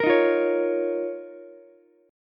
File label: Eb 7th Suspended 2nd Eb, Ukulele; Eb7sus2 strummed; JGuitar